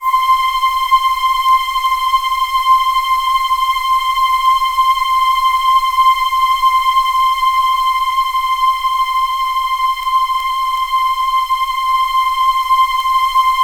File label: C5, Synth Leads